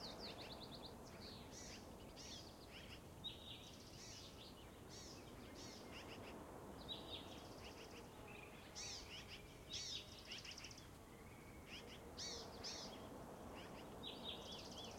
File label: amb combat; heartland